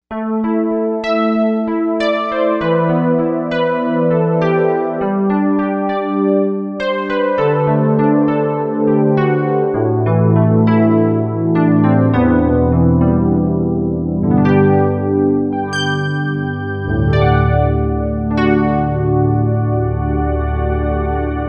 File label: Pad Piano, Korg M1Rex; Factory Patches